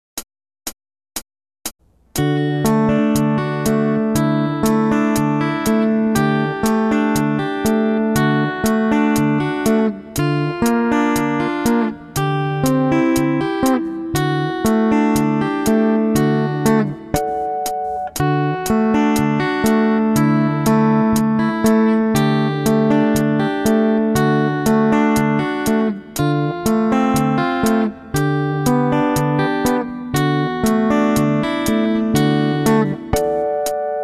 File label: Rhythm Guitar